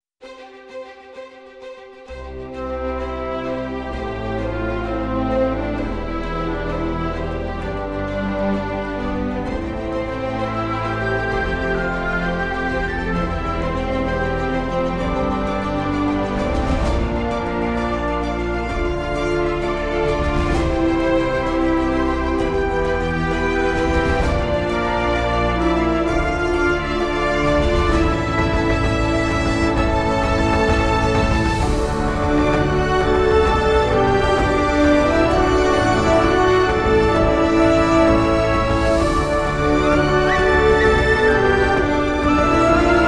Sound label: Forest Flight (No Choir); Fired Earth Music